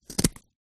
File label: scissors cut fabric; foley; zapsplat